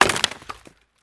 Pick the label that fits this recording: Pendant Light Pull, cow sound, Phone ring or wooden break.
wooden break